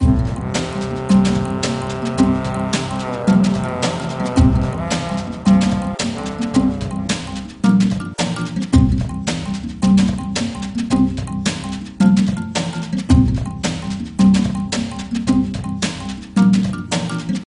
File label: Jungle Level